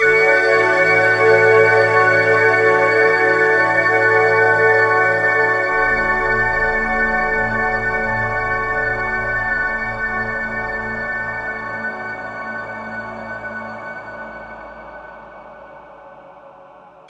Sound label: pad lofi (D); Sonic Foundry (Sony Creative Software); Ugly Remnants VOL-2